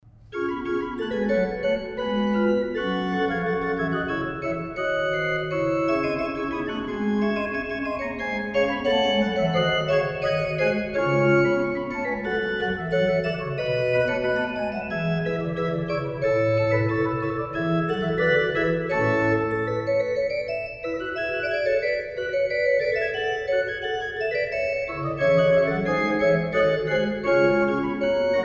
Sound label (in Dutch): Sonate in g kleine terts